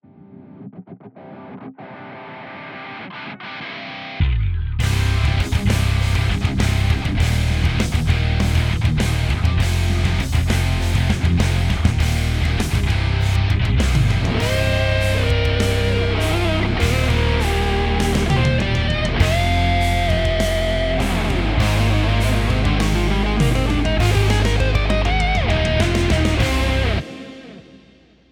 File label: heavy rock tone solo